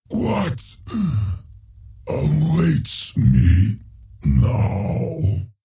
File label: Death; King Ymiron